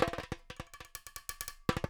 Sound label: LOOP Perc Mono